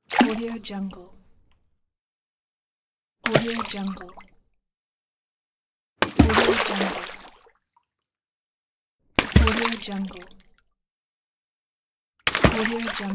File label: stone dropping in water